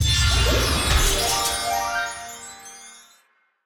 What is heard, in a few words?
chest Picker; fairymirror